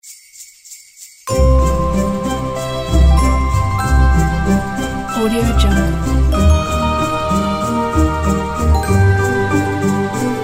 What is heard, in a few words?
christmas ident